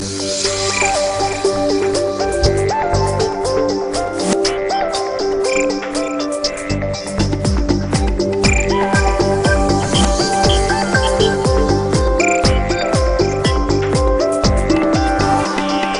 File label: Is Calling Rap